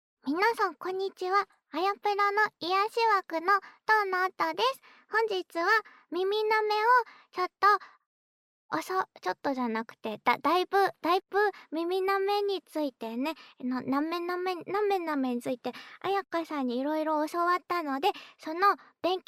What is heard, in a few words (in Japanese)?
耳舐め練習, ASMR